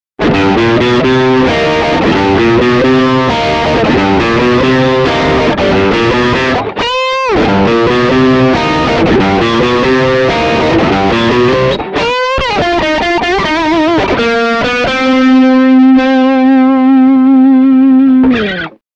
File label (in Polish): Squ Przester S, classic strat single